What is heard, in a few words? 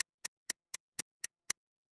mechanical clock tick